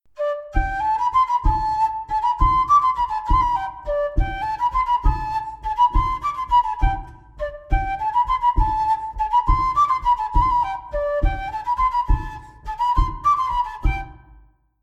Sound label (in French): bourree; Coatrieux